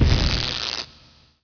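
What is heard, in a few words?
electric charge; Machines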